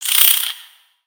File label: Spider Exert; Lego; creatures mechanical